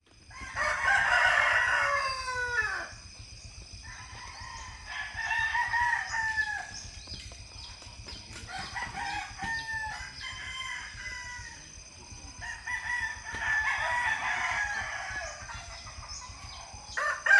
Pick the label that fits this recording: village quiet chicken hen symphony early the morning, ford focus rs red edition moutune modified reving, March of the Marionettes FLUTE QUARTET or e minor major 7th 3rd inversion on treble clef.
village quiet chicken hen symphony early the morning